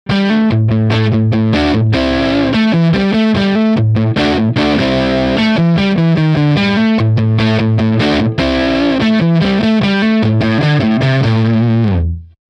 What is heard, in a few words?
top boost tone